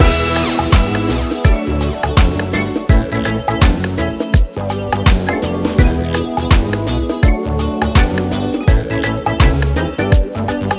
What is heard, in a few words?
Side Mission Loop, Music; TMNT